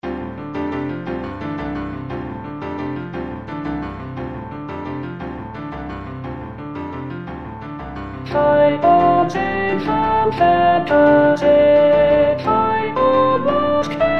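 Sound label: Fie on Alto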